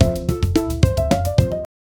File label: quirky fast paced jazz lo, comedy